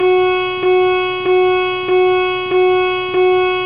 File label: General Quarters; USN